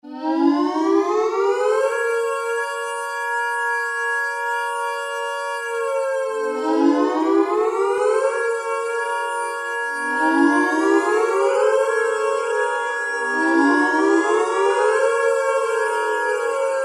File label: Air Raid Siren, Roland PN-D50-02